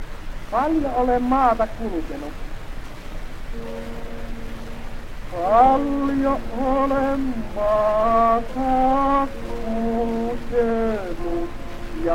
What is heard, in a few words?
Gramophone